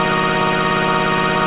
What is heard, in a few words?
Synth Shot; Vengeance Samples